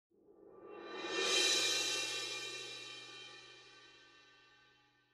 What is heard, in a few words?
Orchestral